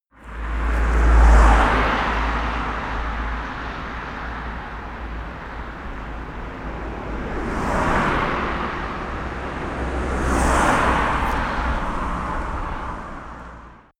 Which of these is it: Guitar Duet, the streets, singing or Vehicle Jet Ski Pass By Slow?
the streets